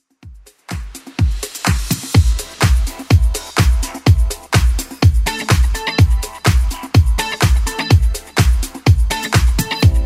80s Club Edit 125bpm

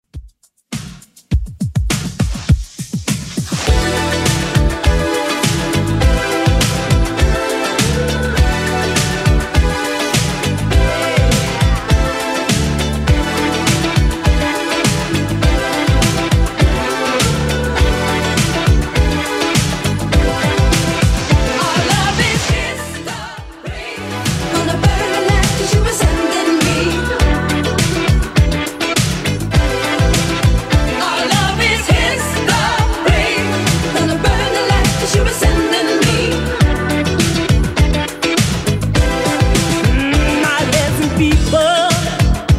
80s Redrum 102bpm

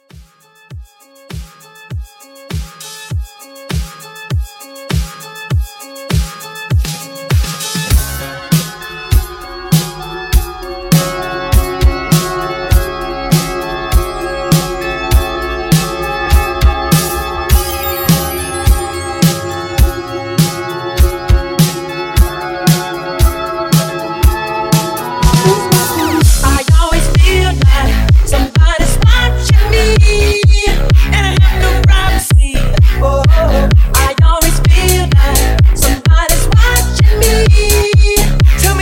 Transition 100 128; Halloween

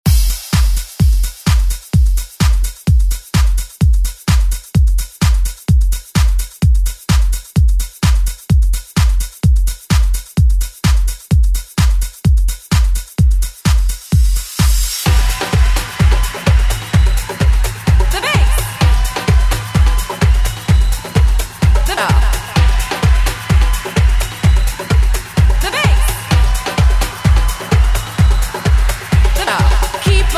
Saxo Re Drum

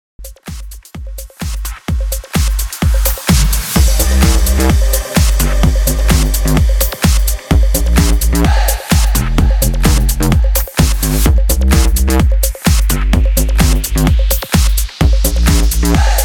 Bass House 128bpm; Bootleg

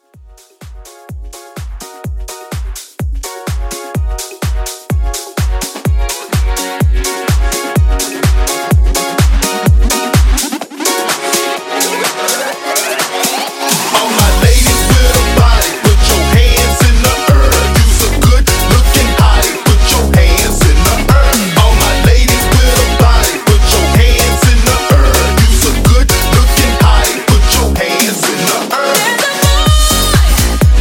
Club Hype 126

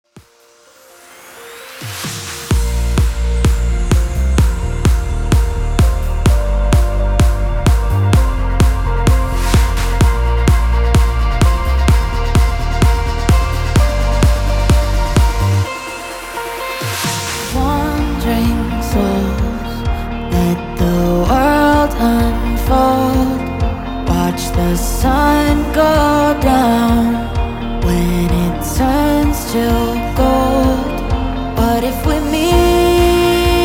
PROGRESSIVE CUT 128

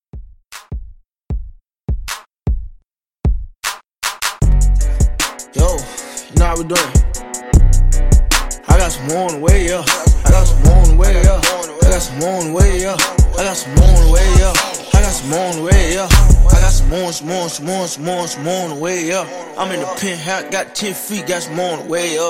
Hip Hop R B Redrum; Remixfreakz

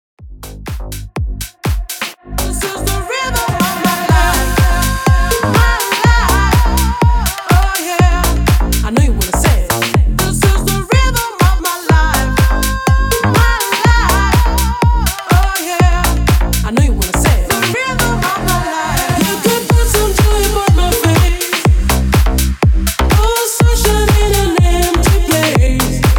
Hype Edit 123 BPM

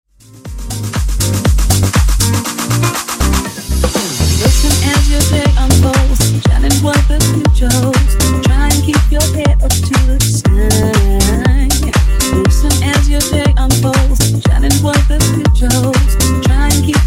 Disko Funk Remix 120Bpm

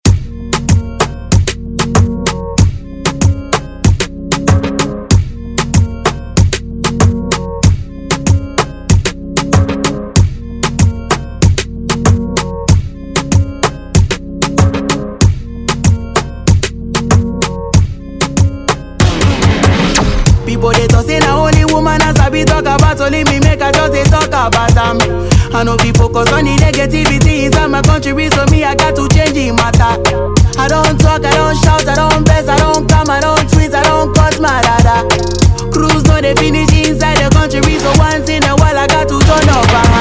Afro Moombah; Redrum

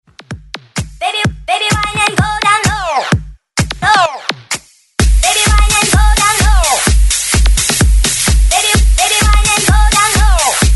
128 BREAKMIX; DJDOLLS

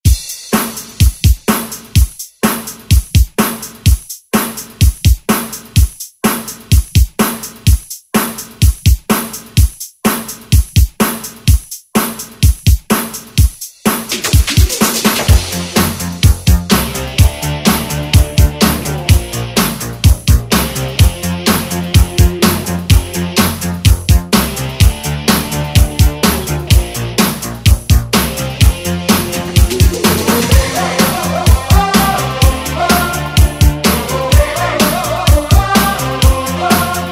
80s Redrum

